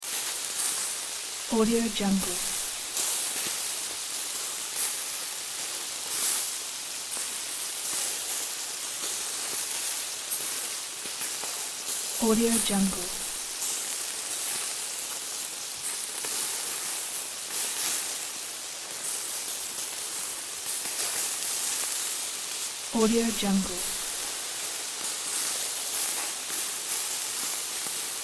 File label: bacon sizzling